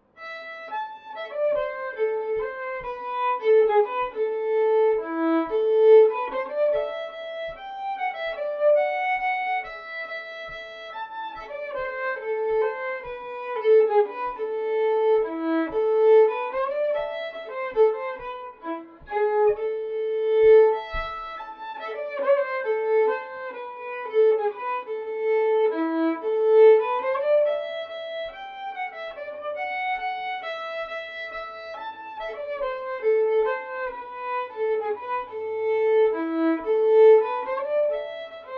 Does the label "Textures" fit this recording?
No